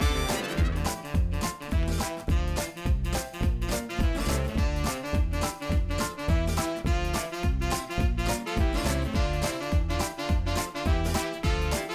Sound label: Main Menu Loop